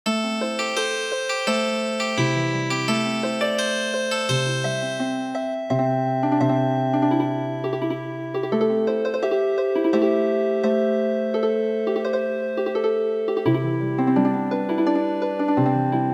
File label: Healin Harp; Red hat white hat